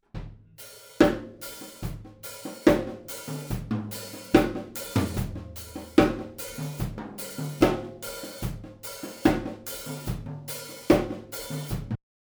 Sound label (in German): Overhead 2 Roh